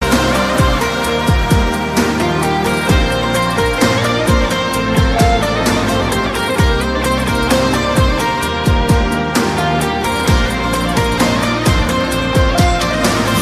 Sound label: Guitar Solo